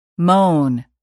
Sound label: moan